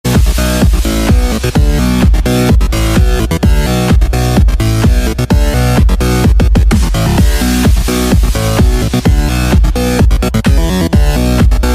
Spooky Skeletons Beat

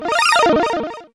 Stunt Sound

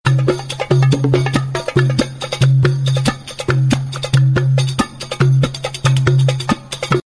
arabic drums; ringtones; sms

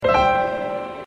Menu Chime

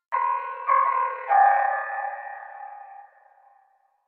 Chime

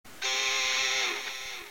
Guitar Squeal

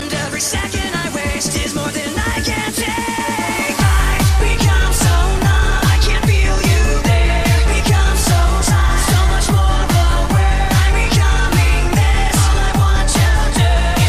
techno remix, ringtones electronic

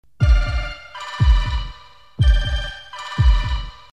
alien tone; children

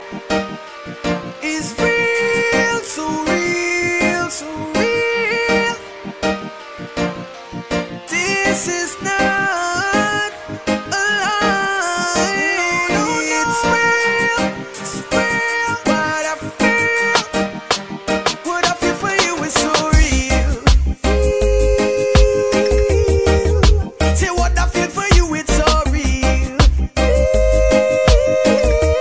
reggae; christian

